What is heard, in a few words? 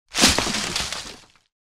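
big branch with leaves broke off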